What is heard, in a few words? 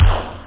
DZING, SNARE; Workbench Add-On - Volume 1